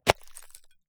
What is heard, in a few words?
foley destruction sweetener organic; impact hit